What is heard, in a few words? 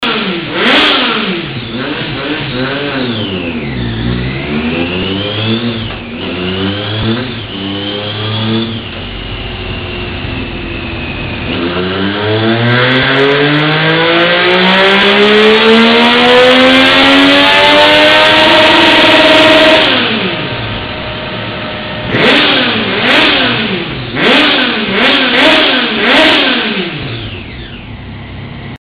TRIUMPH STREET TRIPLE; 07-12